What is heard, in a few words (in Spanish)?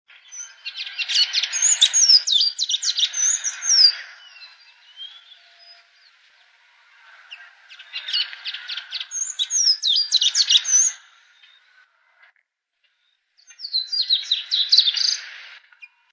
voz